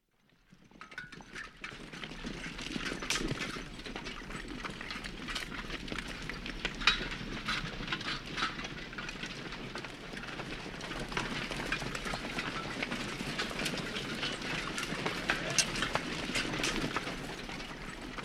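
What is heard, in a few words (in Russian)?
cavalry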